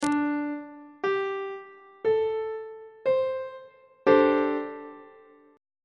c double sharp dominant 7th suspended 4th chord on treble clef